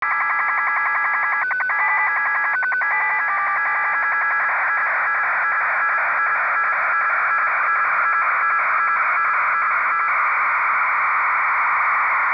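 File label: 1500 Bd QPSK traffic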